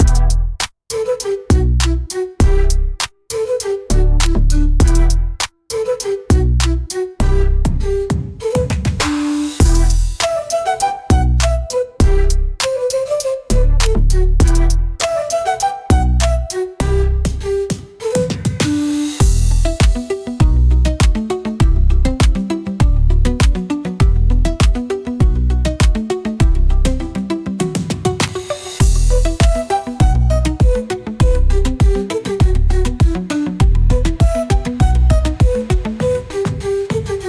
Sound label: Pop